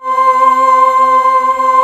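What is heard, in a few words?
female choir C4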